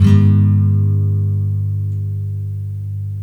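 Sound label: NYLON A MINOR DOWN, CHORDS, Guitars; Spectrasonics; Hans Zimmer Guitars VOL-1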